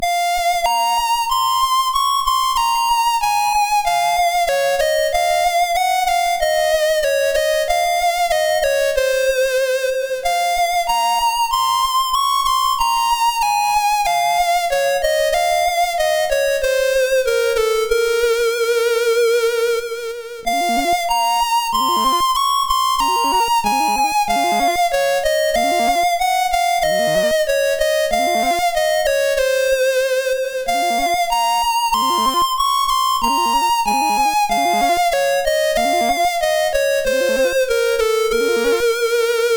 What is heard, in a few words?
Norwegian Sunset, sid MOS6581R2